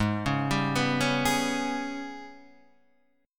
Ab Minor Major 7th Double Flat 5th, down, Guitar, DADGAD